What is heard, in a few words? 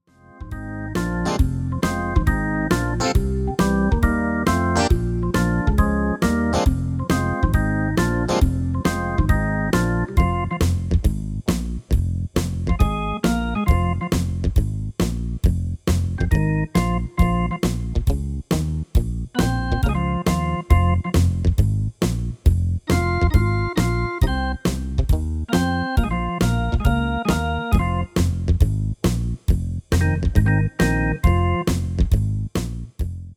MIDI